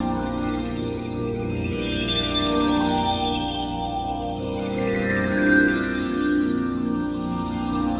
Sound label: Guitar Textures